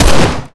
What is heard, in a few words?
fire, glock; Urban-Coalition; Decay; arccw ud